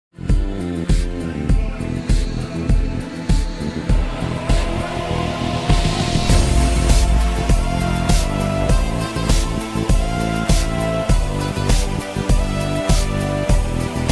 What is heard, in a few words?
instrumental